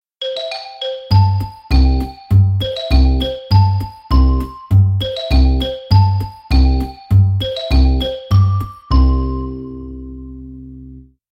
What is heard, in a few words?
Success; crazy belts windows gamerip 2016